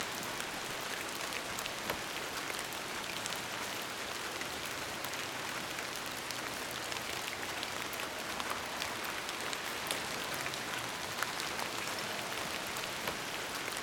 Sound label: Rain Medium On Street Pavement